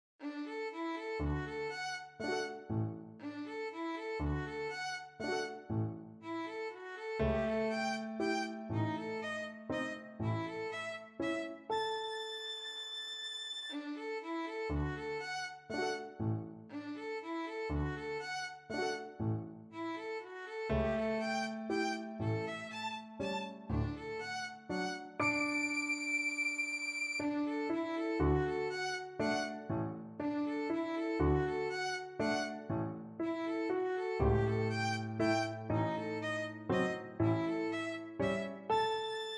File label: valse lente violin